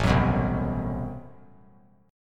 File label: A Minor 6th Add 9th A, Chord; Am6add9 strummed; JGuitar